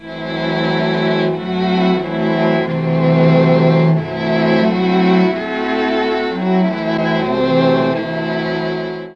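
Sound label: A#3 bass strings, Mellotron; BASSA+STRNGS; Mike Pinder - Mellotron Archive CD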